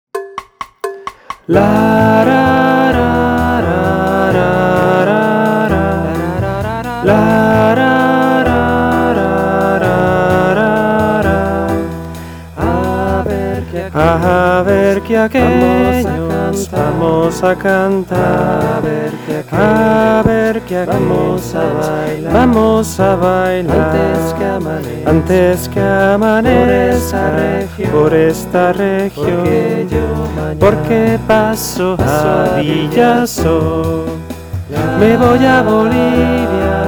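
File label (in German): Männer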